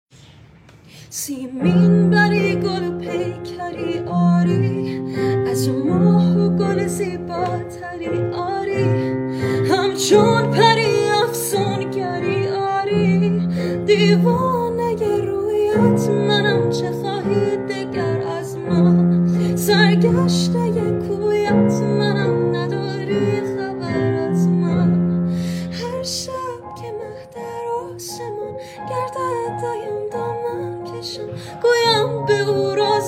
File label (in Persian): ba sedaye zan